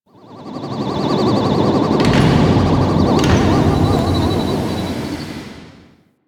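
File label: Ship Generator Start Stop; Warframe; Corpus Ship Remaster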